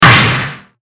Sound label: Hit Static Burst Fuzz; Blastwave